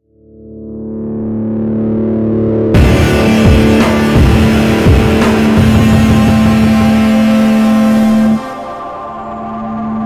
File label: Sting Reveal Area